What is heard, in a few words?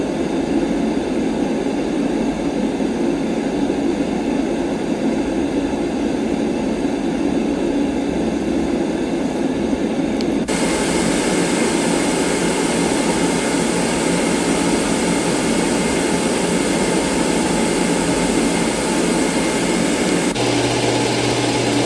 fan sounds